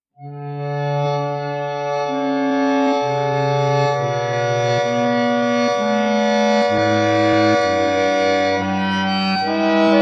duet akordeonowy 432 hz; bach aria

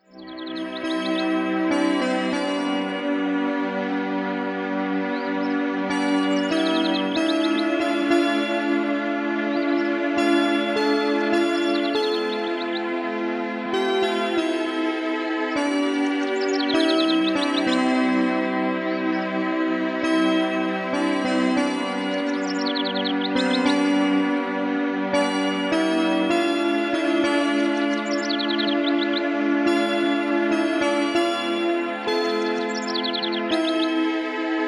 muzyczny miks relaksacyjny 432 Hz; sloneczny dzien

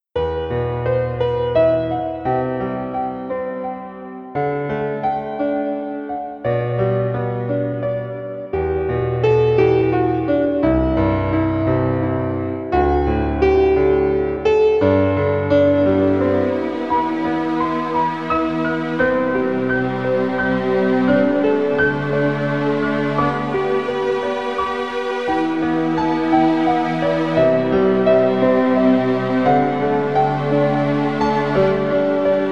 432 Hz, Muzyka o charakterze muzyki filmowej

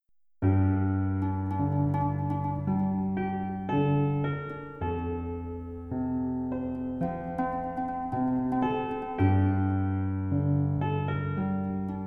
muzyka aniolow 432 hz

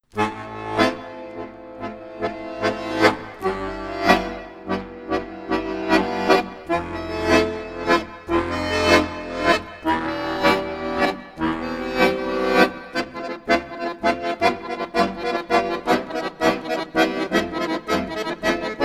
duet akordeonowy, tango, 432 Hz

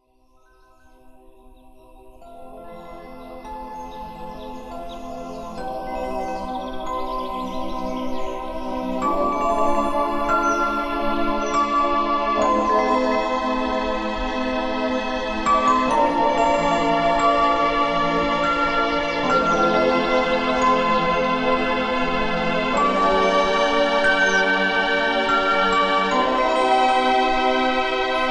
muzyczny relaks 432 Hz; letni czas

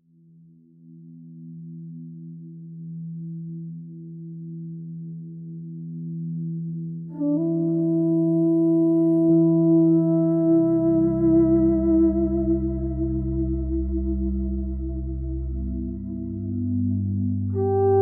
Nastrojowa kolekcja utworów instrumentalnych; Quiet Glade

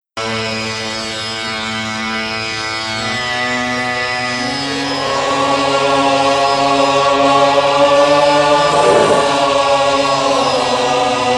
om namah shivaya, rytualne ludowe mantry 432 hz; mantry swiata

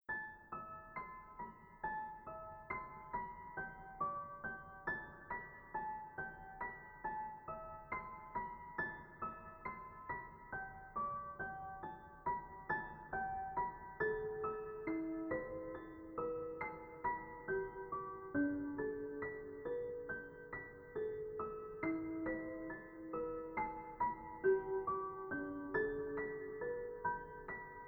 pozytywka listopadowy 432 hz

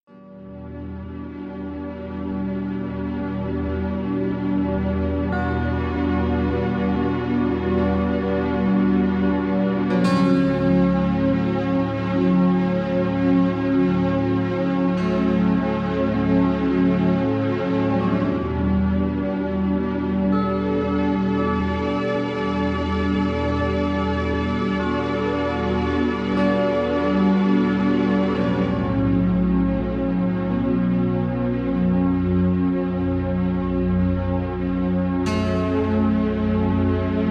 harmonia i odprezenie 432 hz; milczace sny

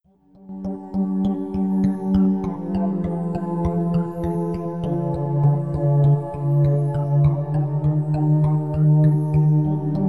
taneczny relaks, 432 hz

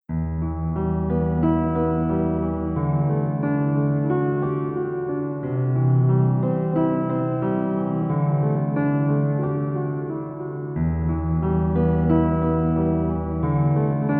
pozytywka, 432 hz

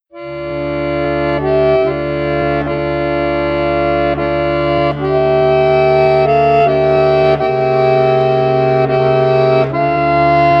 duet akordeonowy concerto d moll 432 hz; vivaldi

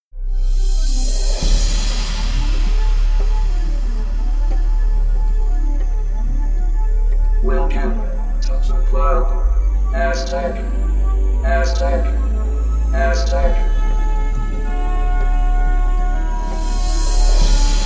taneczny relaks, 432 hz

